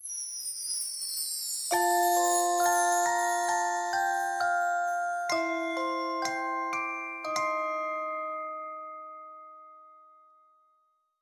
Classic Mode Clear; Chiptune; Wii; Super Smash Bros Brawl

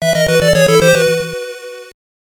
player death; Chiptune; Turbo-Grafx 16; Castlevania - Rondo of Blood

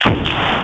ROCK

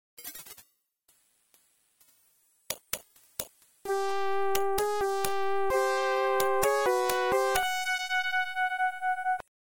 Attack; Genesis

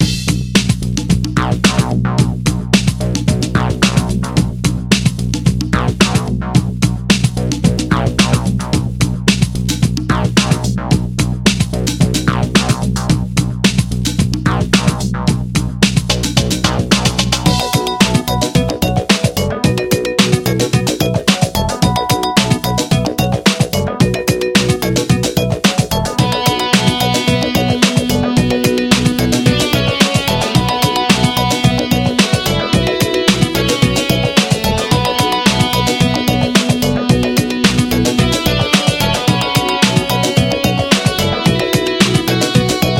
Beat Ambience loop alternative; Genesis; game soundtrack; Streets of Rage Remake Unused Music

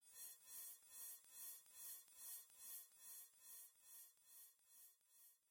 Background Noise; Ultimate Qix; Genesis

